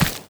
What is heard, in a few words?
run stone